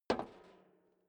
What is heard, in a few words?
step boots metal rattle single right; schritte; Footsteps